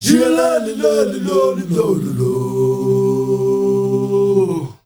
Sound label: MALE GRP, CHOIRS; Spectrasonics; Heart of Africa